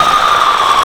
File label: deep guttural low pitched; supernatural